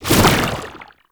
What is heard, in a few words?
Proto Infested Brachiolyst Projectile Whizz By; Warframe; Abilities